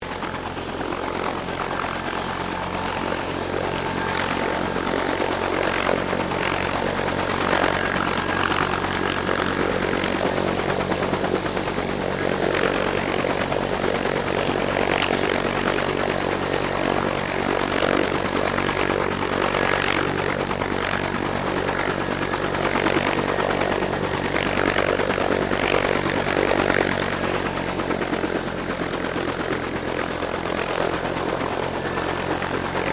HELICOPTER 1967 BELL UH1 IROQUIOS PREFLIGHT; RAN UH-1 Iroguios; Vehicles; Aircraft; Helicopter Sound Effects; Helicopters (Sound Effects:Vehicles)

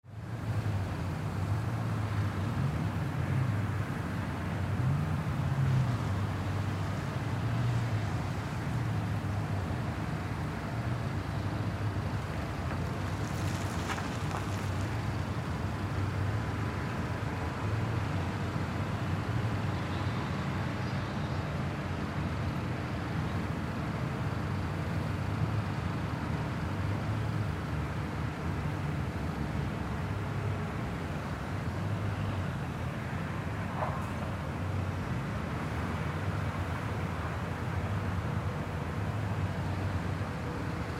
Construction Site Distant Perspective; Ambience; Blastwave

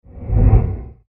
Dungeon Trapdoor Movement; Creepy Door Sound Effects; fantasy sound effect

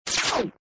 Laser Shot Hit; Scifi Production Elements, Set 1; Science Fiction Sound Effects; Lynne Music

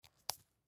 Beads Tube Close, Beads Close Lid; Beads Close Lid Art Craft; Pop Sound Effects; Objects; Foley

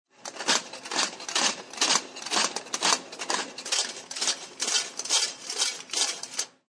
Food Mill; Cook works a grinds food mill; Food Prep; Restaurants; SFXsource

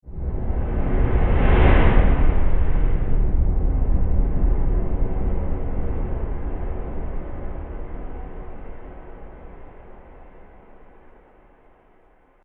Flashback Transition Whoosh

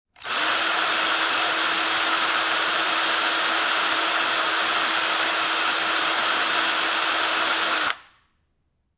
Drill, Electric Drill, Turn On, Run, Turn Off; Industry

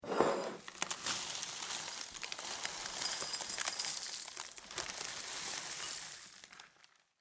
Food Cereal Pour Into Glass Bowl; Rice Krispies

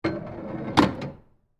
Ski Ball Return, Arcade; Technology; Arcades (Sound Effects:Games); BlastWave

